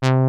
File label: RESONATOR, MINIMOOG